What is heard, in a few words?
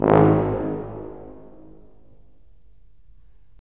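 brass tuba; LA Composer vol. 3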